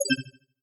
Button Confirm Spacey

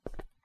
Footstep On Stone

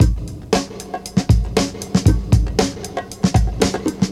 Classic Breakbeats

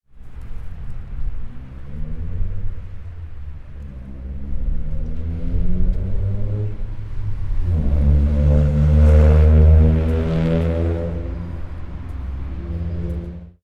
Auto, car

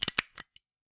Stapler Usage

